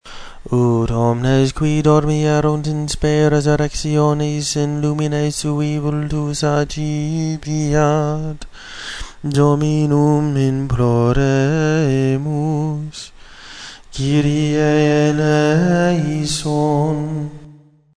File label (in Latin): Oratio fidelium Tonus E, chant